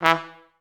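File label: staccato E2; Best Service; Peter Siedlaczek's Advanced Orchestra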